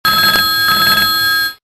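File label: uk ringing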